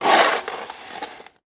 Weather Snow Shovel Scoop And Drag Snow On Driveway; AdobeCC